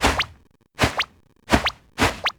grabbing; herman

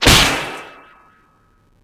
aura smack

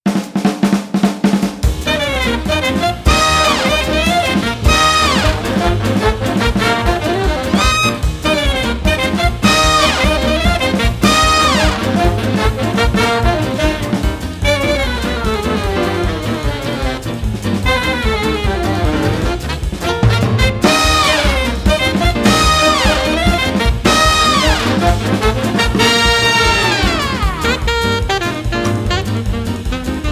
victory tune; cuphead

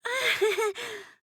ahehe; tenko

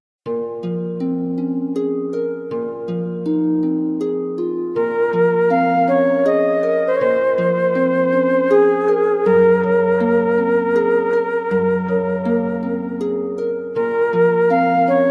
lost forest, music, relaxing and calm

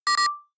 hand blast loop start; bullet; bbcf

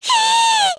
heee; monophanie

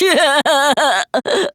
laugh; jataro

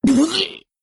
bleurgh; toushirou

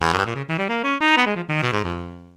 big band saxophone full

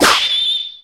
fwashing

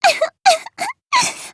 cry; monaca

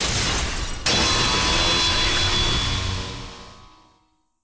cross swords; asayoru

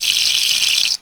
aura squirt

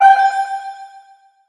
shock

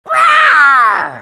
mono roar

